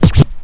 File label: vinyl